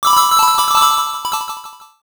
coin shower

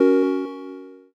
inner voice

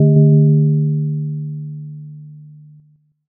gong

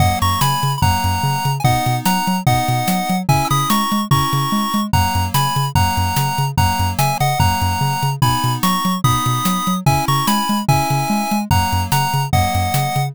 bgm street

